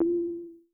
Perc Reso, Vermona Mono15 Drums

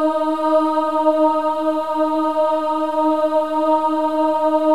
OH D#4, WOMEN OHS; Spectrasonics

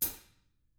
HT RA FOOT, Drums; DW; BRUSH

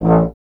tuba; Miroslav Vitous - Solo Instruments VOL-2

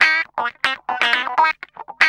GUI, LICKS; 120 LICKS

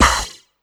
hit, Drum & Bass 160 BPM; Ueberschall; Drum & Bass Flash Trackz

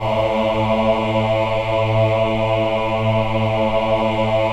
VIB A2, VIBRATO, Voices; Spectrasonics

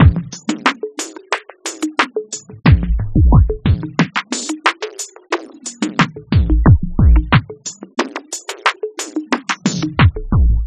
machine 090bpm; Zero-G; Downbeat Dance Grooves

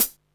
HAT, Techno 138 BPM; Best Service ProSamples vol.54; AKAI

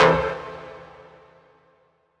Dance Mega Synth; Best Service; 90 s Sample CDs

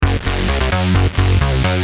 Bass, Industrial

